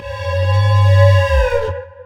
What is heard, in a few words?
centipede death; fowl-play; elder-centipede; enemies